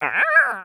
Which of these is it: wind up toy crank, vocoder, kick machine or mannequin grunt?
mannequin grunt